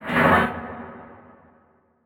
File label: Transition Whoosh Fire; Production Element